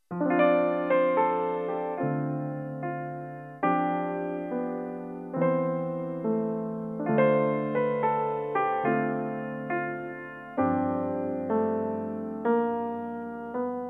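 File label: piano play reference flugelhorn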